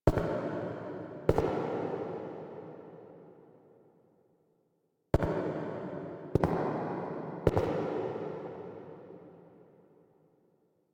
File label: Steps Close, Foley; Apostasy; Ballas